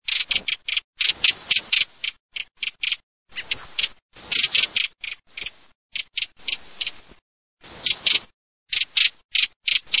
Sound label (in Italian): SASSELLO SCHIAMAZZO